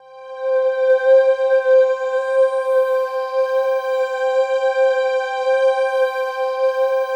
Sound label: pad, atmo pads; Best Service; XX Large